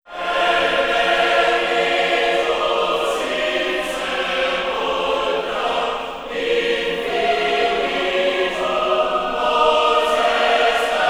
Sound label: Choir; трейлерная музыка